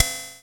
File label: OPEN 370 HAT; Kid Nepro; Elite